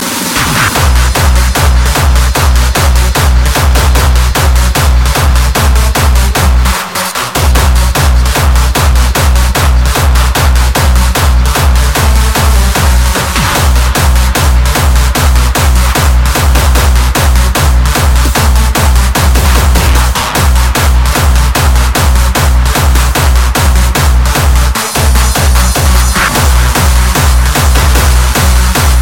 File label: Acid Phase